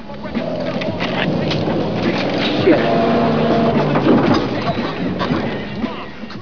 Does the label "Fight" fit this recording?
Yes